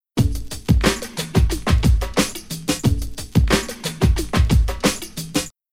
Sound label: Rhythm HipHop